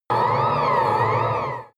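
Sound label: extrinsic vocals, energy; energie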